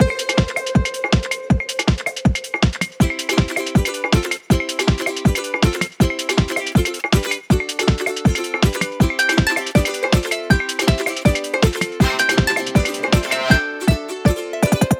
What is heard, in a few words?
Easter Island Intro Loop; boom karts multiplayer kart racing android